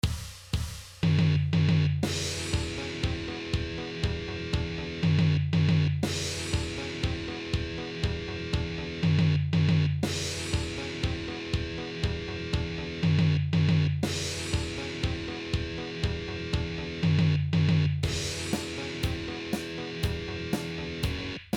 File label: Riff, guitar